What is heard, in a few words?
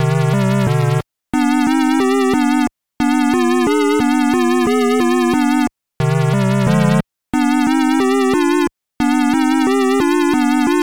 sneaking through a graveyard; 2019 Eclectic Music Pack